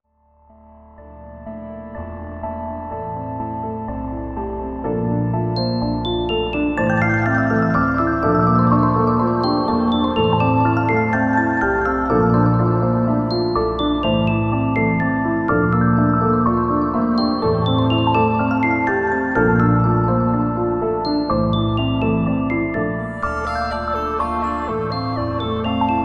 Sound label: Ambiance Chill